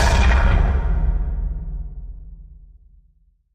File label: Big Echoey Sputter, Taylor Grand